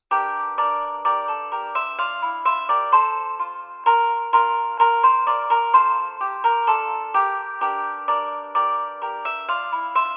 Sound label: Uke; Bransle de Champaigne